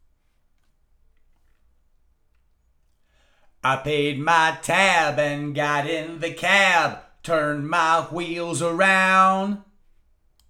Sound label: vocals